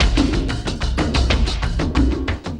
Cym Drum, Frame Kendang; Sonic Foundry (Sony Creative Software) - Friendly Fire; Drums; Long

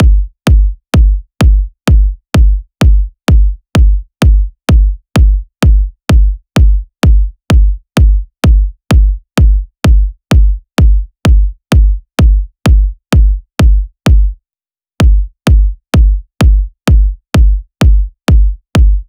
128BPM BD; Essential Clubsounds Vol.5; Let's Work It Kit; Root F#min; Drums & Perc

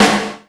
DRMS, Underground Hip Hop

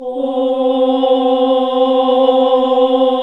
OHH C, Nuns Ooohh; Sound & Vision - Gigapack II

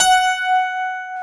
PIA OLD, PIANOS

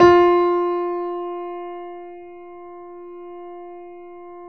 F 3, GRAND P; USB Soundscan vol.17

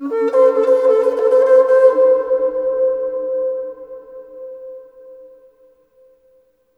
HRNPHR, Heart of Africa; AMB. HRN FX

